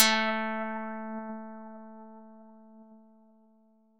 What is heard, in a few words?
Flanger